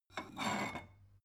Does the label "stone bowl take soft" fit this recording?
Yes